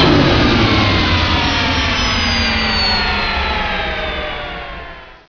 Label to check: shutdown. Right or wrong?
Right